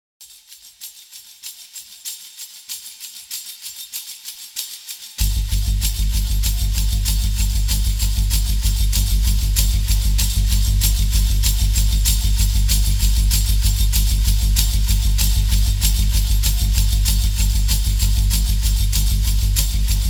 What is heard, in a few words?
Native Tambourine; Curiosities Volume II